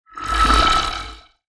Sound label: Sci-fi Transition